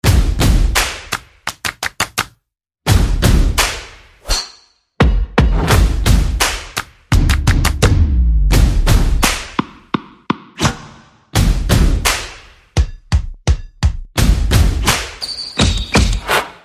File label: Buzzer Beater, No Melody